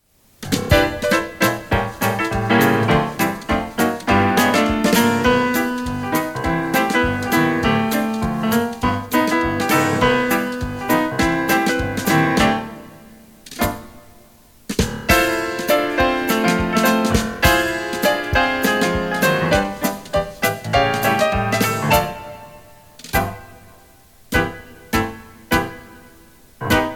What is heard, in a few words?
Quick jazz played very fast, tap